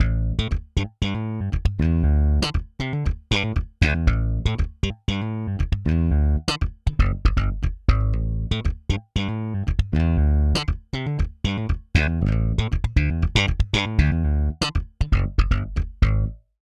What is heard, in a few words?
tube dry; grit blender; ub dsp